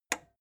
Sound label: plastic button small simple push